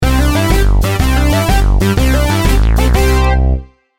loping sting

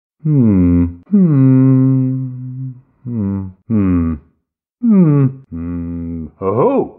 mmm mmm mmm; siegmeyer

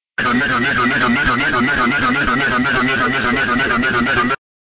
music

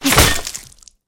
headshot

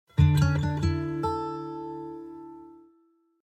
mnemonic fast guitar; shell

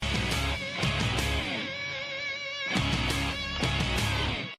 guitar; sportscenter

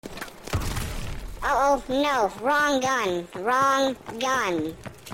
wrong gun

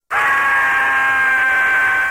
funny scream

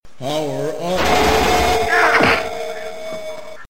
powerup

